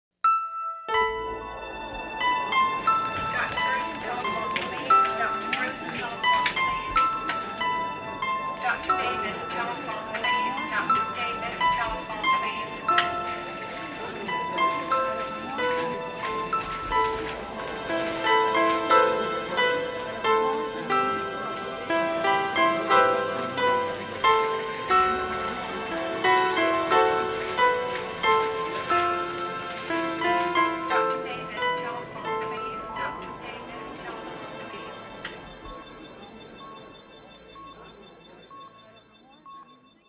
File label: Music- Piano with hospital